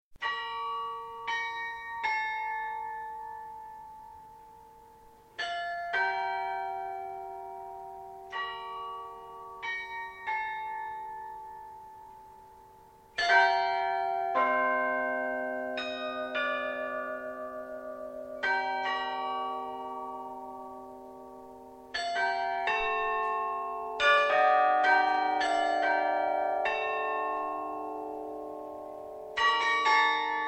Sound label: cycle for carillon Solitery Bells; poetry impression by Jonas Mekas